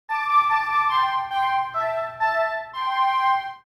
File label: Cartoon chinese musical accent